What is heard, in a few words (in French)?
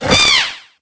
Cri; flambino